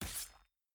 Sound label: Attack Spear